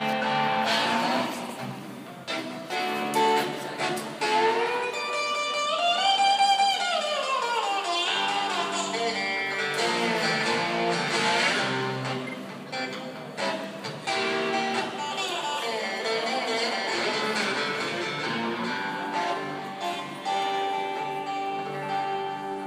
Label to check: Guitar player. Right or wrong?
Right